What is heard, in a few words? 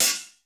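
processed hat, drums; Crypton - DrumBase5000; PROC.DRUMS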